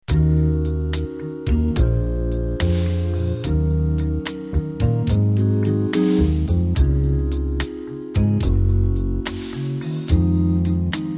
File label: Jazz Vox